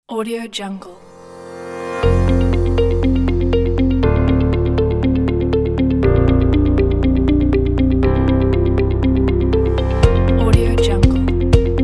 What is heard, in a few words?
advanced technology